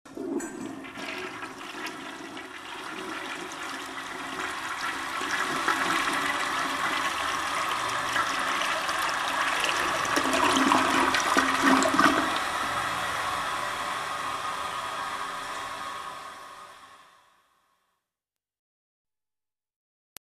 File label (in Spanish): taza de water